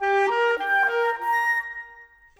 Clarinet Flute; Sonic Foundry (Sony Creative Software); Orchestral VOL-2; Modern

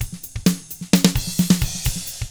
HRDRK, hard rock drums

